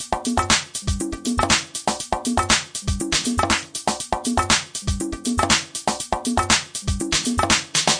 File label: 120 my conga luvs drum machines, DRUM LOOPS and BREAKS